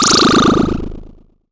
Retro Bonus Sound; blastmorph pinball; xbox series xs

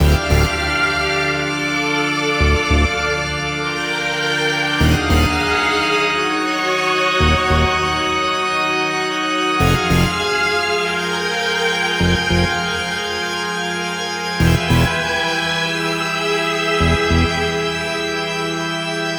Monotone Organ Loop; Rejected Loop; Laser Disco Defenders; ps4

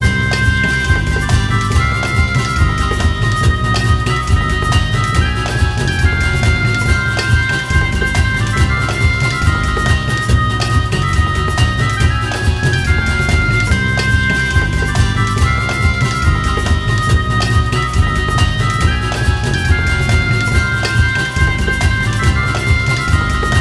Opponent Down; Bald Bull

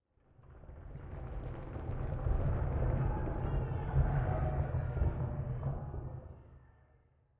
Cave Shifting

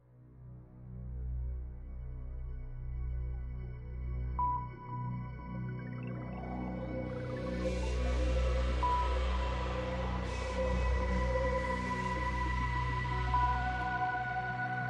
Backgroundtrack; Boss 101

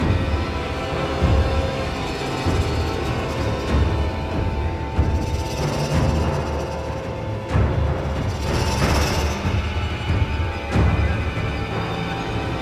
dialog danger

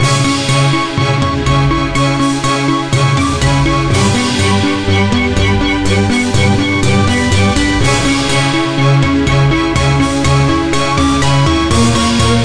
Danger Loop; Aces of the Galaxy; XBLA Windows Xbox 360

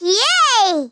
Yay; Dora the Explorer Journey to the Purple Planet; PiratePig

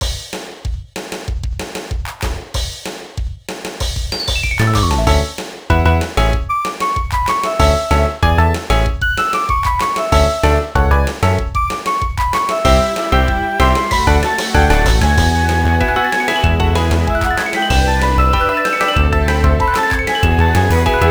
Bicycle

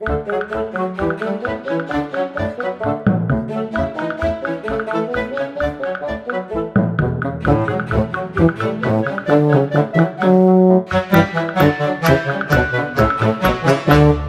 Tune dark version Loop; Fable Heroes

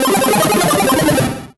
Solo Chip Arp Negative

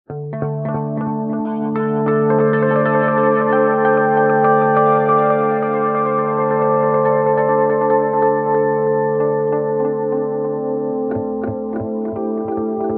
G steel

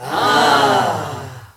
reaction

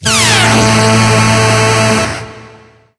UFO; Touhou; fan-made; Virtual Autography

